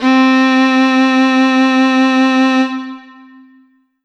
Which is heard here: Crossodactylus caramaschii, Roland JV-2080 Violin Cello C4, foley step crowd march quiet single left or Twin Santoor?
Roland JV-2080 Violin Cello C4